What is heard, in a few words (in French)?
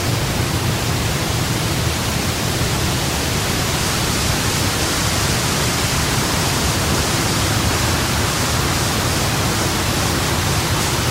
Foret avec legere brise quelques insectes